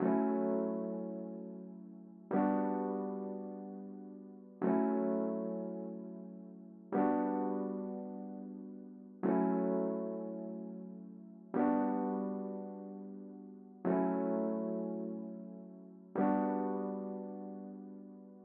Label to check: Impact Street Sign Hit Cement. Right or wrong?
Wrong